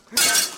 glass breaking sound

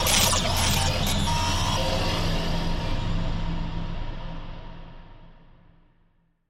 glitch logo; purefalcon

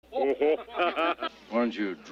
hohohaha

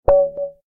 beep; lichess

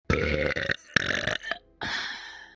big burp

